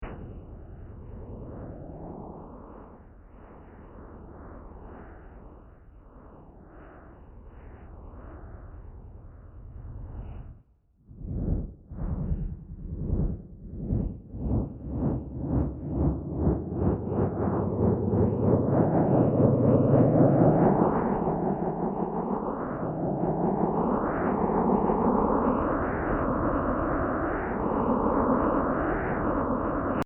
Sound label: Wavelets Isolated